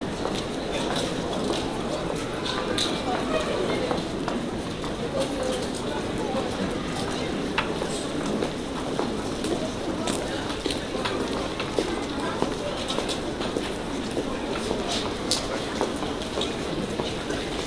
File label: crowd footsteps no cars; best service Blue Box; crowds interior